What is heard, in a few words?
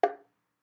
Small Drum